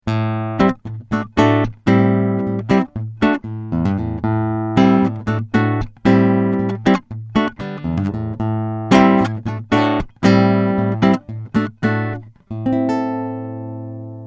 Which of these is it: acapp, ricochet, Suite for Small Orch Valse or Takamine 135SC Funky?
Takamine 135SC Funky